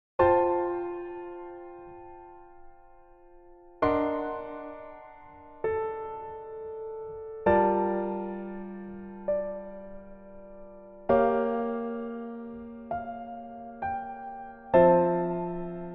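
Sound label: Piano version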